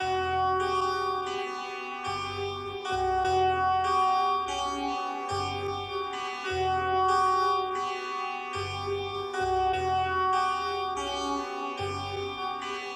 Loop

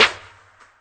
Snare; Snares

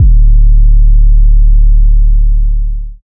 808 - D; Cymatics; Astro Sicko

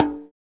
African drum, kicks

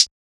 HI HAT

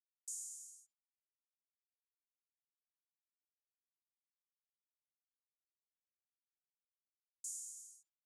Hi Hat Open; Beat Starter

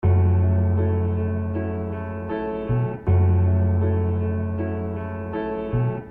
BPM 158

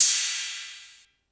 crash one shot bounce; Crashes & Cymbals

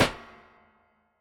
Round Up Snare; Snares

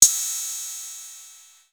Open, Open Hats

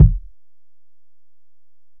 Kick

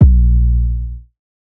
808's

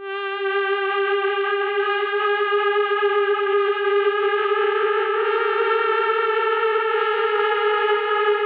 Vox

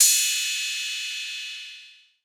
Cymbal

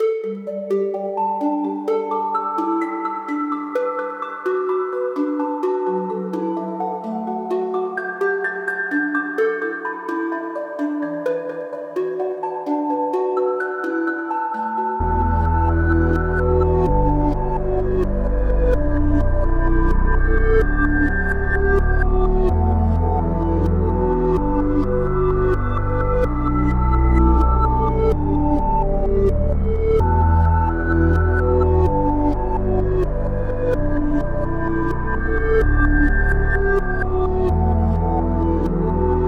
128 BPM

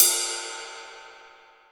Percs

